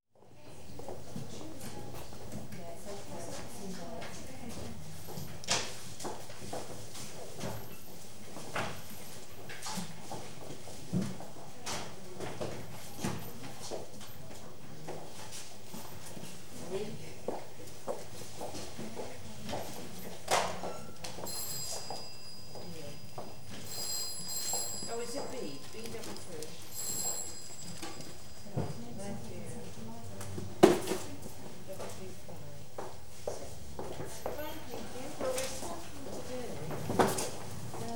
bibliotheek met telefoons

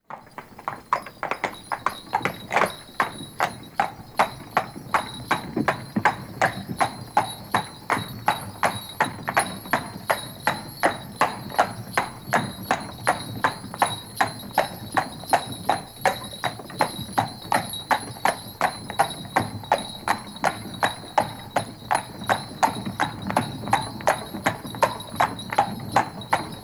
rijtuig paard belletjes

